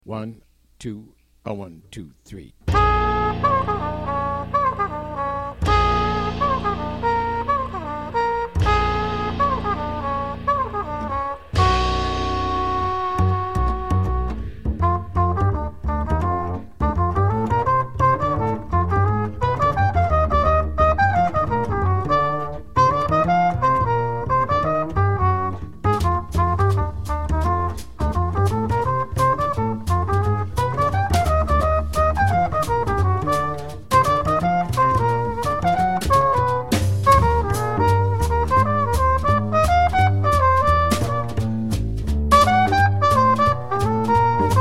minus piano; count off sets up the intro; play figures and comp (where indicated) for the intro

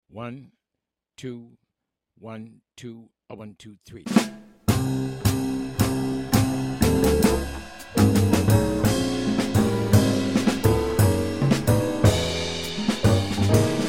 minus melody; count off sets up the intro; play the intro and melody; solo 2 choruses; play the out melody and coda

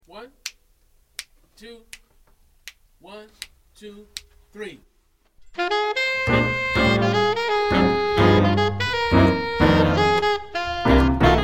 minus Drums; sticks throughout; count off sets up the intro; play figures for the intro; comp/play figures for the melody 2 times

